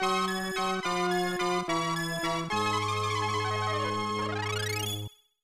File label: Fall Over; Slime World; Mick & Mack as the Global Gladiators; SNES